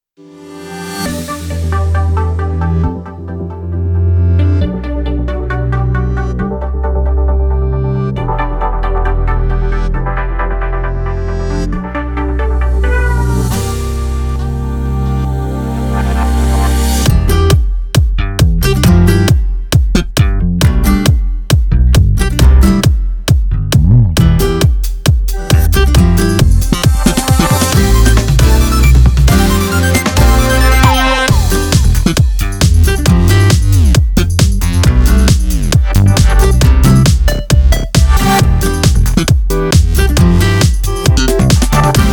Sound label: Instrumental; mxm vita s.c.m.l 2016